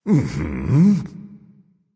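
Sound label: nonverbal agree; smite; voicepack; ullr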